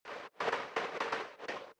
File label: Drums